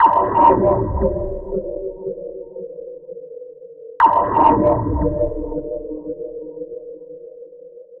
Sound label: SFX, Trance NRG; Sonic Foundry (Sony Creative Software); Trance NRG VOL-2